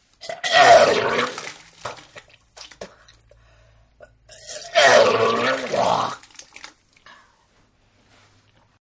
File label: Vomit; Vomiting; Human